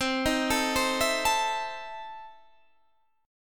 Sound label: E Major 7th Suspended 4th Sharp 5th, C